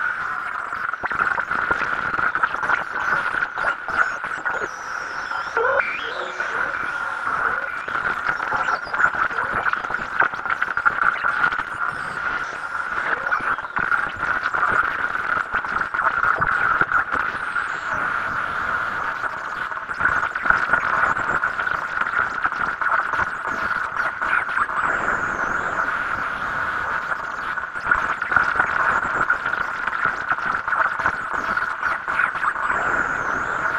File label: crepitata, insects; Spongefork